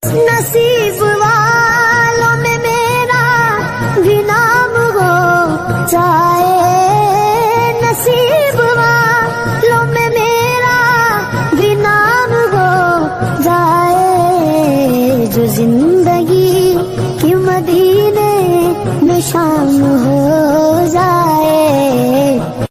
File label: Islamic Ringtone